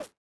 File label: step stone